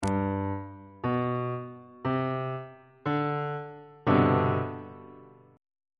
d double flat major 7th suspended 2nd 2nd inversion on bass clef